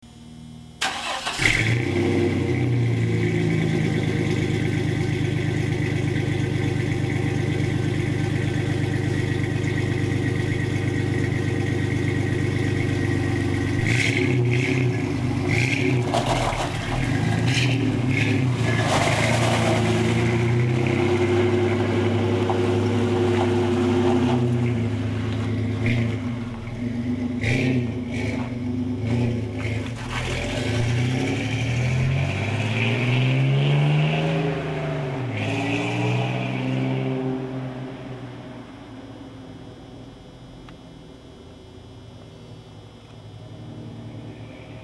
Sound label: exhaust